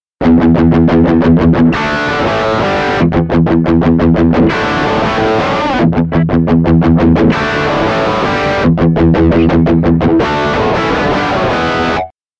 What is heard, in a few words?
PAF Bridge Drive